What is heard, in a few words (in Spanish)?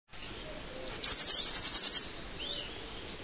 canto Herrerillo Comun